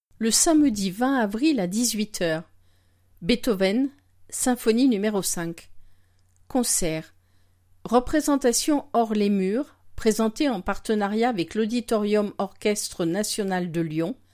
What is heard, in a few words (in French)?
Beethoven symphonie n5